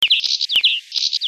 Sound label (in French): Tarin des aulnes